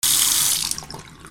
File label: BATHROOM